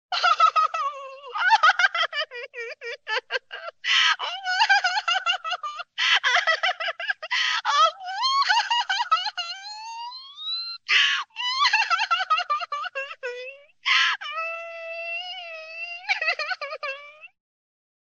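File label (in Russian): звуки плача и хныканья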